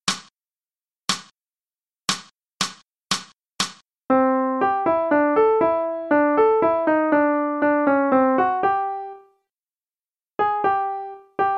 Transcribing Rhythms; non-swinging

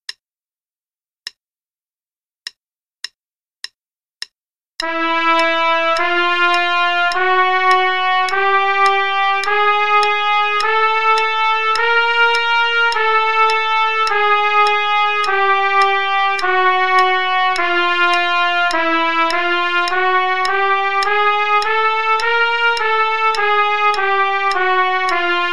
Play-a-long, Chromatics, qn=104, trumpet

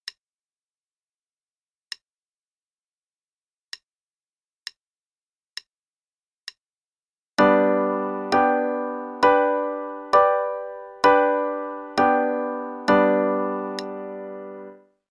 Chords for G Major; CM Level 2

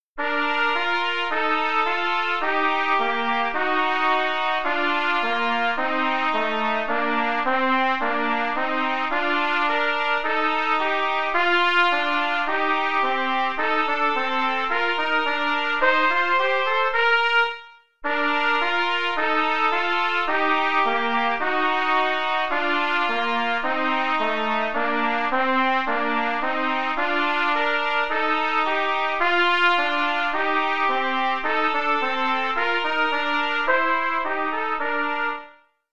Duets, qn=108, trumpet; Amsden Duets

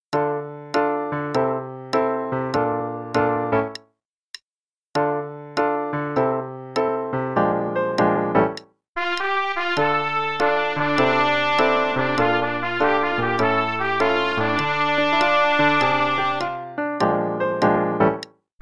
Play-a-long both parts, qn=100, trumpet; Hot On the Line

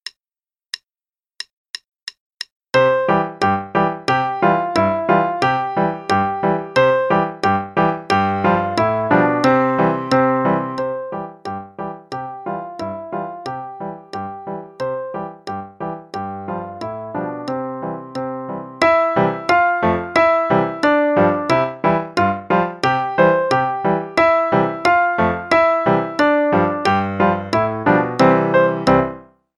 Hungarian Dance (both parts, qn=180); Faber Adult Accerlated; Adult Accel Level 1

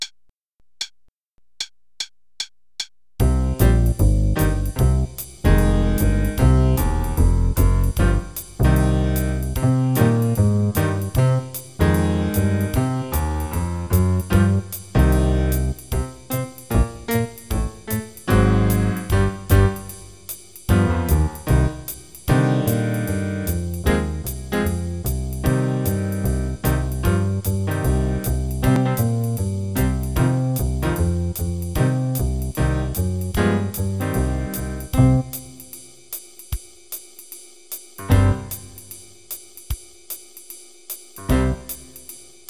bass and drums, qn=152; Level 2A - page 45

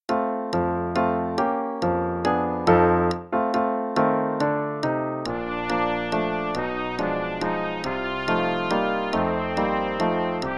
Play-a-long in Bb both parts and click, qn=140

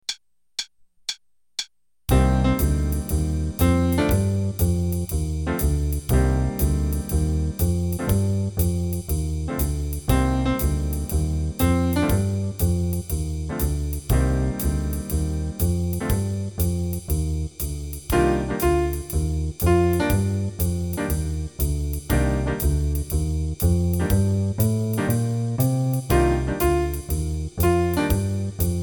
Improv Cmin with Jazz Rhythms, Trumpet

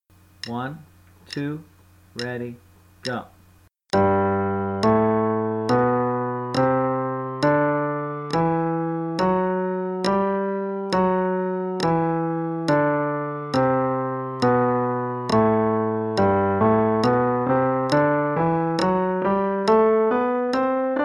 G Major Scale (qn=69); CM Level 2

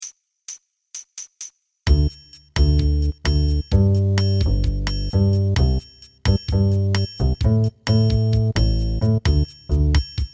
Salsa rhythm only; Salsa Nights; Bass and Drums Only

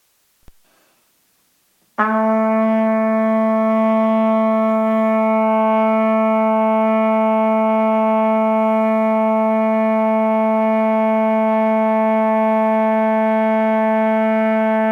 Long Tones Low B, Trumpet

